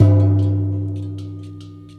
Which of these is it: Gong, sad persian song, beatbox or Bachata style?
Gong